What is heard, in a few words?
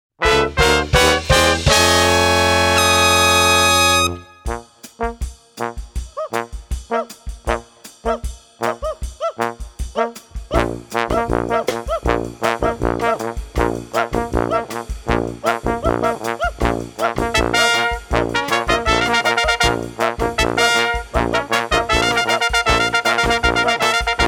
Brass